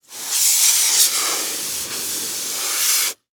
Emergency Fire Extinguisher Spraying; emergency effects; AdobeCC

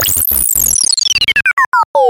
production element descend data; Distortion Glitch; PremiumBeat

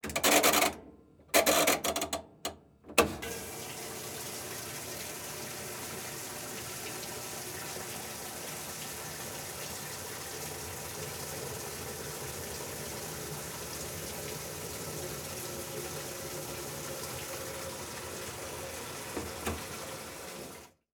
Household Washing Machine Turn On Fill With Water

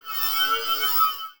Sci-Fi Electronic Warble Scanner; Science Fiction

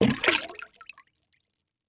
Liquid Water Dunk

